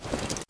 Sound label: Retrieve; car simulator 2